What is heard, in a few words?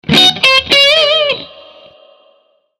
instruments guitar; maoudamashii